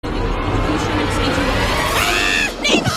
scream

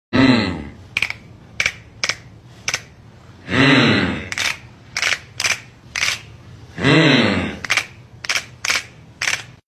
hmm snap snap

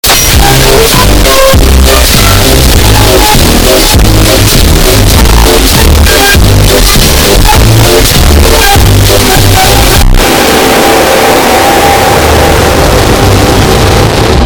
bass; thomas the train

